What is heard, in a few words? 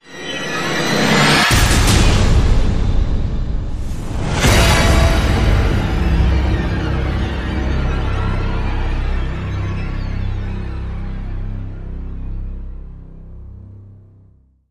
Hit (C); Eat My Skin